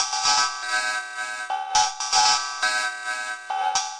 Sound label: watery cymbal wash, 120 bpm